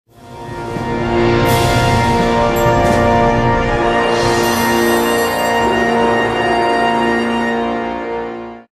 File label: Starter bank fanfare sound; Pixel Gun 3D